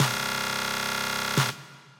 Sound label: buzzer